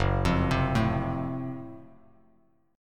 F sharp Minor Major 7th Flat 5th F sharp down Bass Standard; arpeggiated